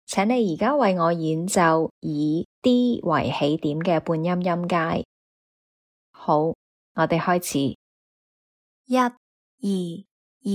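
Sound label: chromatic scale starting D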